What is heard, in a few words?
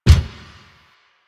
Gear Shift Up, Motorcycle; Motorcycle Ninety Nine